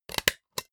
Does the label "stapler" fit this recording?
Yes